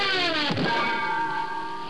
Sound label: anvil